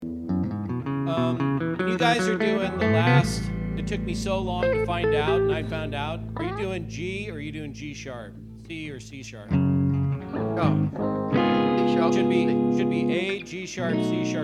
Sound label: Strings